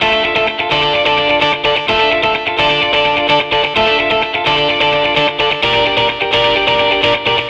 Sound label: Electric Pop Guitar 128BPM Dmin; Electric Pop Guitars; Vengeance - Essential Clubsounds Vol.4; Guitarloops